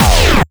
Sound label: duuuun